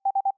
hover

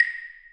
clave; dsp2008 sound library

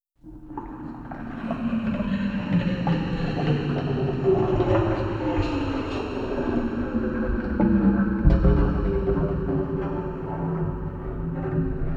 Filtered Tube

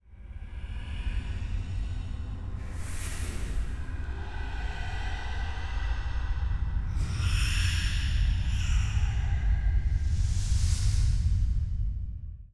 ghost spell

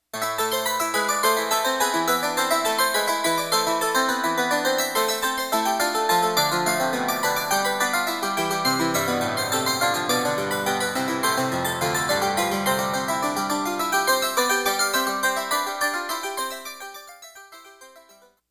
harpsi

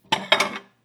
plate, Pots and Splooshes; ChucK

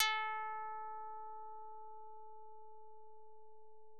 karplus

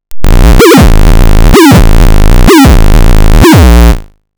rhythm

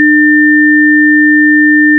add timbre

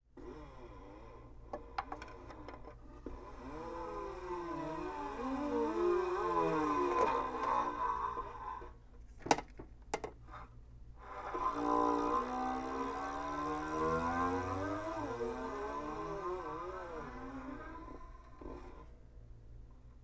String

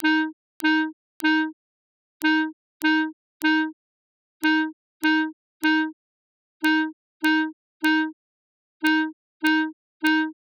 Clarinet vs Ideal impulse

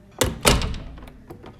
door

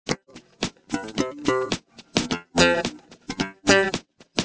bass

